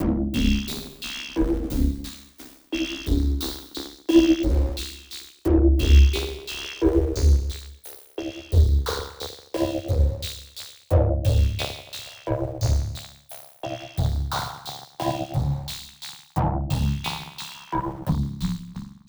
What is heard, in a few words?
Moonshine Jam, Beats; Sonic Foundry (Sony Creative Software); Ma Ja Le Ethereal Textures